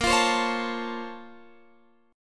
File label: A sharp Minor Major 9th, down, Guitar, Open F; A#mM9 strummed; JGuitar